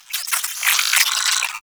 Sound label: space drone attack; Warframe; Corpus Space Drone